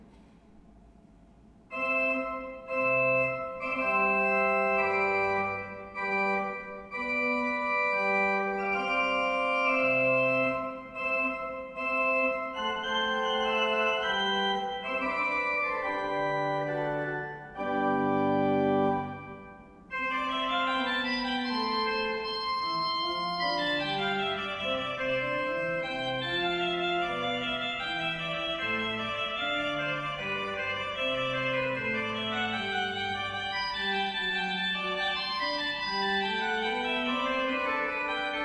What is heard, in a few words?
Voluntary, orgel; Odoorn; Drenthe; Travers